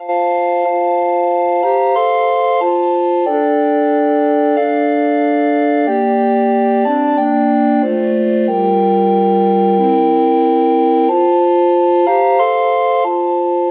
in the bleak, recorder quartet

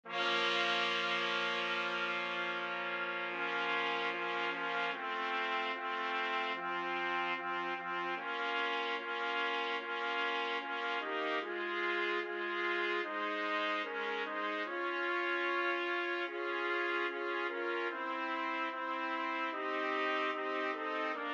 trumpet trio

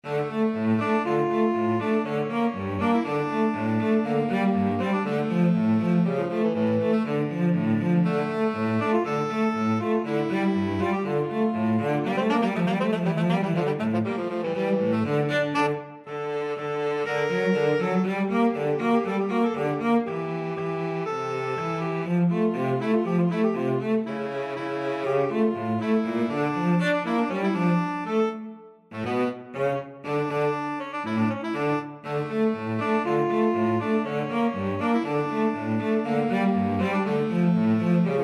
hungarian dance, sax cello; saxophone-cello duet; brahms

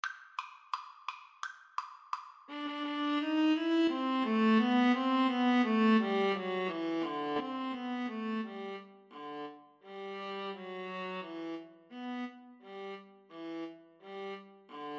viola viola

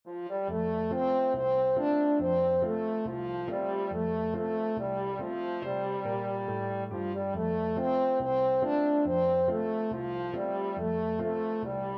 alabama, french horn

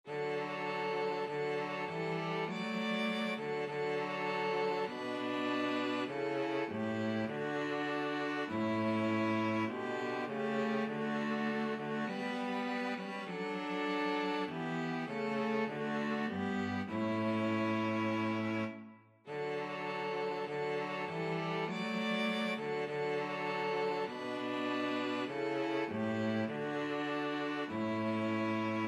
string trio

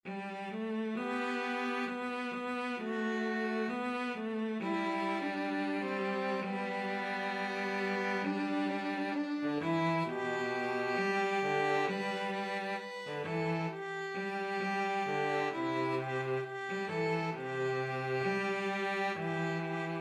violin cello duet

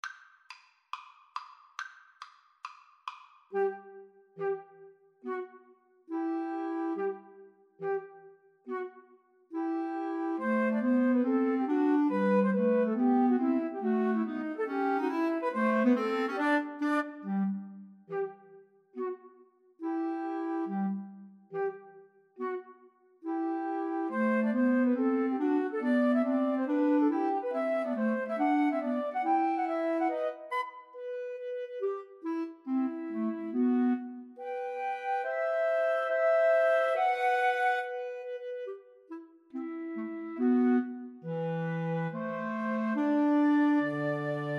tchaikovsky nutcracker, 2 Flutes Clarinet

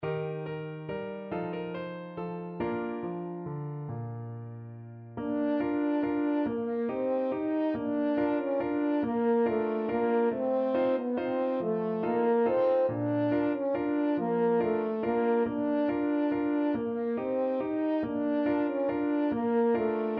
french horn

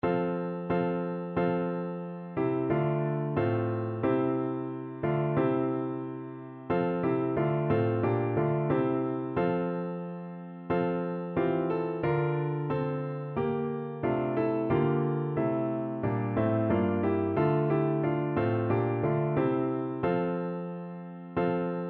PNO, for Piano; Rachmaninoff, Sergei; Bogoroditse Devo from the Vespers

